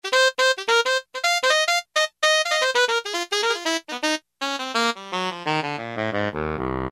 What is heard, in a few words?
Bright Sax, Roland U-110; Factory Patch